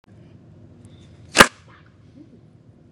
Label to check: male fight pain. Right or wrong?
Wrong